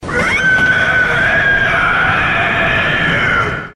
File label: beast roar; cleric beast